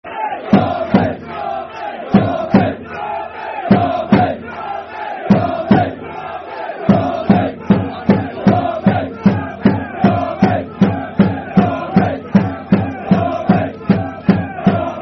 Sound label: Blue Army (Drums) Football Chant (Soccer Song); Wycombe Wanderers Wwfc